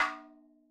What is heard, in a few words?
madalam notes L; Sample Banks; Percussion; MADHALAM